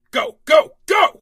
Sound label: charge; st allies; darkwing